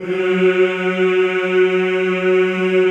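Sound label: choir F#2, Mmhh; Sound & Vision - Gigapack I CD 2 (Roland); Combinations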